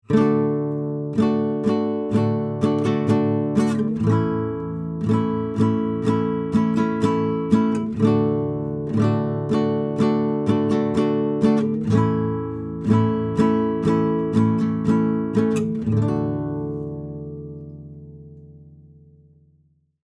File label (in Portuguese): VIOLÃO